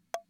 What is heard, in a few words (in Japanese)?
コン